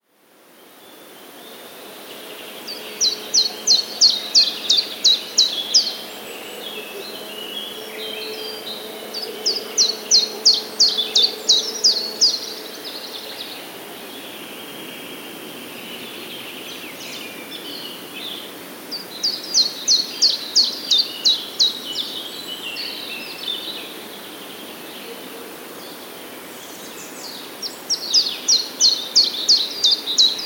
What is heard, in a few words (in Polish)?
pierwiosnek, śpiewu pierwiosnka